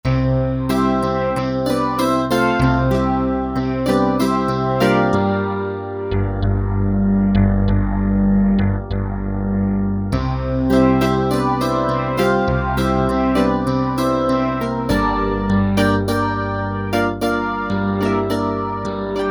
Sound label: 2 voices; Korg MSC-12S Synth 3